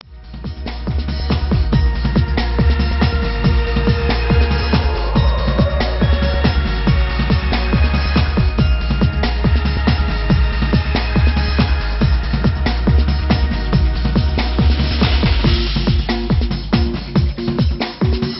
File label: dub version